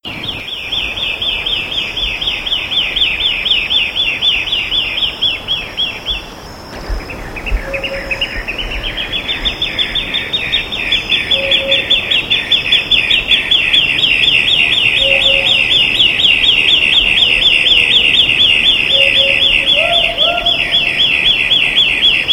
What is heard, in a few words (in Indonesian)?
cica matahari